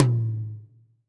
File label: HI TOM, HR-16 Drum Mashines; EdgeSounds